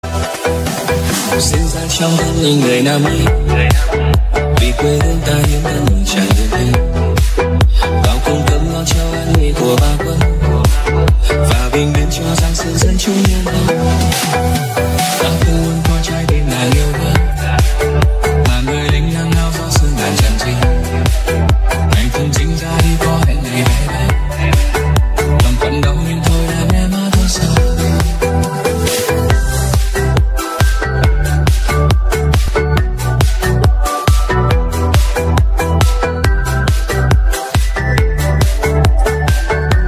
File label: remix